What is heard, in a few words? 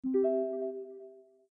incoming message; bitrix